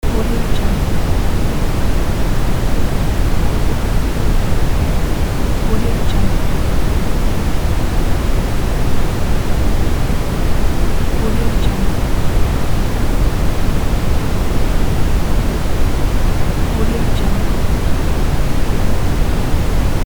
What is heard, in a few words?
brown noise